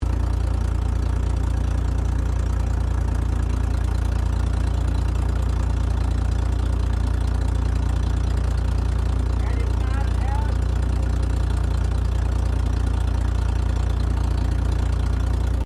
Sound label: Armored Car Daimler Ferret Idle, British Ferret Scout Car; Trucks; Vehicles